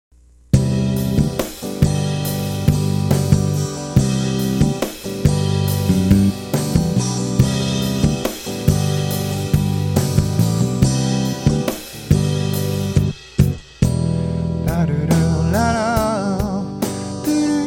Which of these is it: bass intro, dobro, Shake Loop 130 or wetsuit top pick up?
bass intro